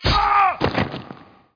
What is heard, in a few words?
cent sword die; Zeus